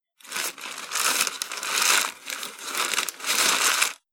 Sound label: Parcel Wrapping Paper Scrunchy Noise